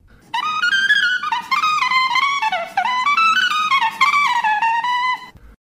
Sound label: Fast Green Sleeves